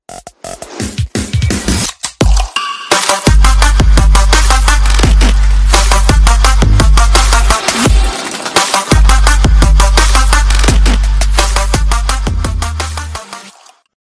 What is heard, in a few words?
new electro ringtone; ipnone x plus